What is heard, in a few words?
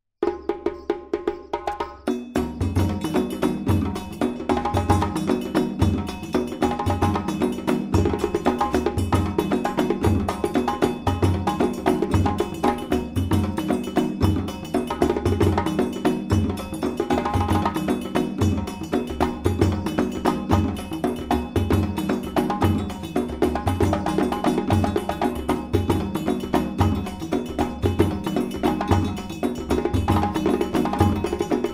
Djagbe Volledig, djembe